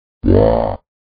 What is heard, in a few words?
quack